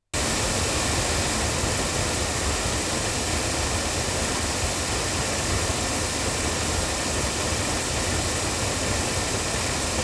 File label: loudspeakers; CM2; LineAudio